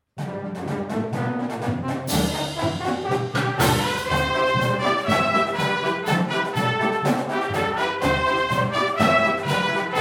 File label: Chimes; Millenium